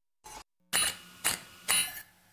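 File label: Background Beeps Noise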